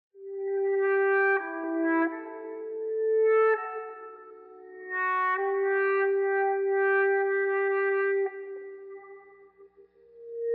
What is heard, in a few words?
Theme Edgy Mood; The Last of Us